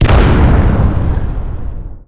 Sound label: blow